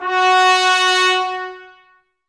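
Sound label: BRS trumpets F#; 90 s Sample CDs; Miroslav Vitous; Woodwind Brass Ensemble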